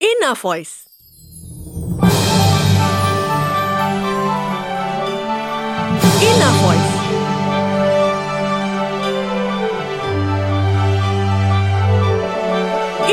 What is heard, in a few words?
early morning song